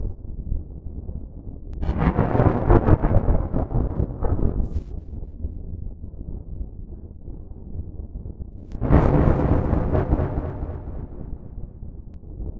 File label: thunder; ambience